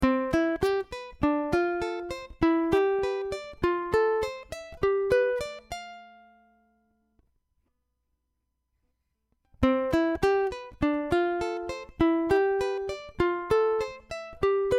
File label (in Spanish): C Escala